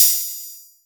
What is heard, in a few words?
Hats, Electro Open